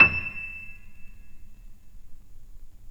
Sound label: PNO MF; Synclavier Sampler Library